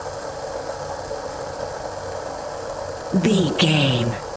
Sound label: cooking constant loop